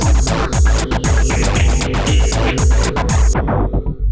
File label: ringtone, electro; Google; NewWave Labs